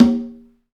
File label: PRC XCONGA, Skin Drums